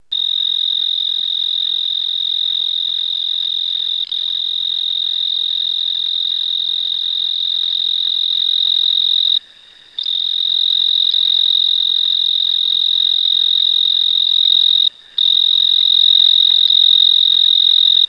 Oecanthus pictus, 22,5degree Celsius

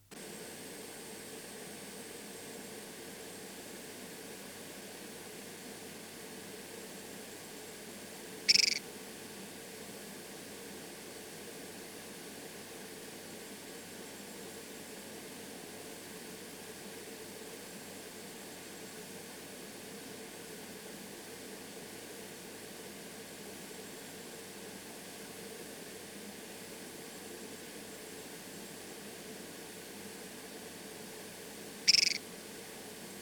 Rana signata; Mulu

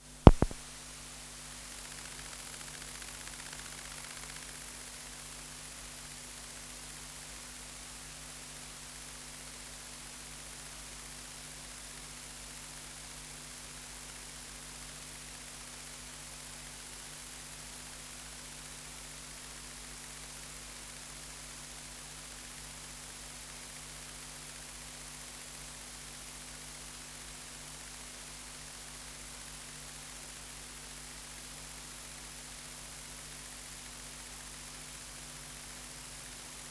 Conocephalus discolor

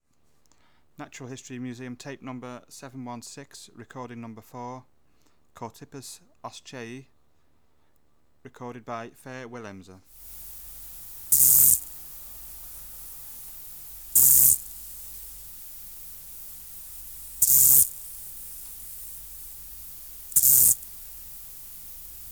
Chorthippus oschei; Natural History Museum Sound Archive

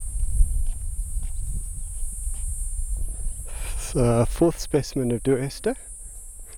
P.duesta Nico Malan Pass

